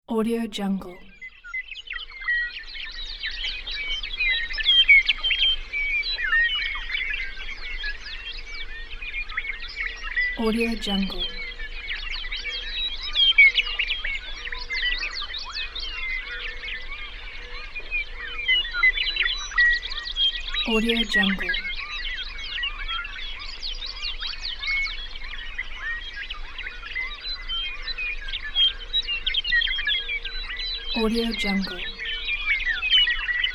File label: birds